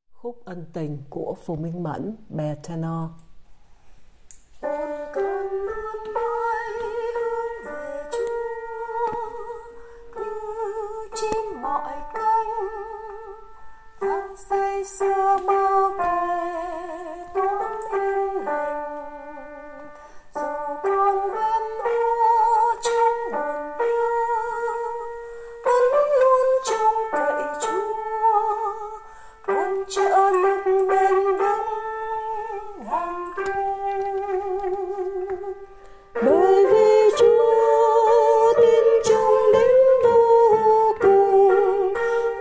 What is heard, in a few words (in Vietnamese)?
Khuc An Tinh Tenor; ca doan teresa